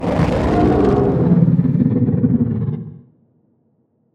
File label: death; Barotrauma; Endworm